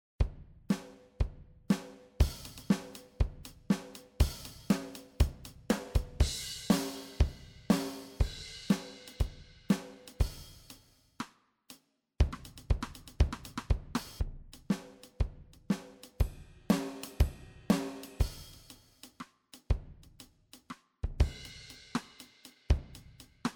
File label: Drummer Pop Pattern; MOOER